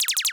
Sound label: standard shot short x4 loop